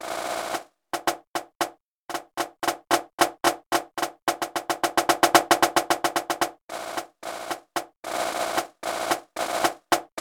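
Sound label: snare drum; Region 23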